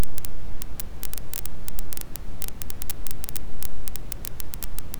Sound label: fire, Nature Sounds; Bonfire